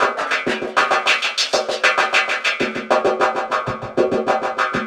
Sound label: Echo Chuff Down Up, Synthetic Pulses